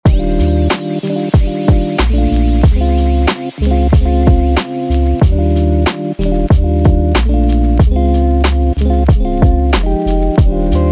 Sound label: music background looping